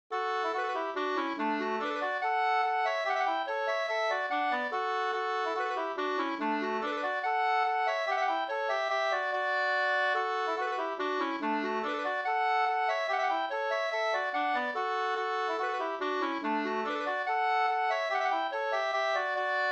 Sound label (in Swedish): mazurka